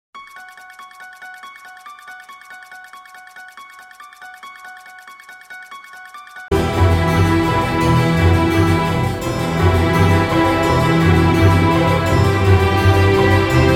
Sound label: Instrumental Songs - Holloween Theme Song; 1001 Sound Effects; Halloween Scary Music and Sound Effects